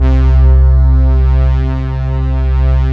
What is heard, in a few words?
SYN, SYN Analog; Sound & Vision; Gigapack I CD 2